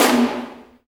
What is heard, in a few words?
SNR, Orch Snares; Roland LCDP03 Orchestral Perc